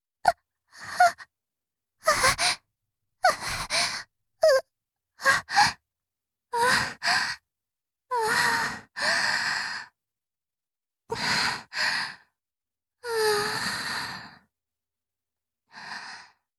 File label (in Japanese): 絶頂後の息遣い; 実用ボイス抜粋集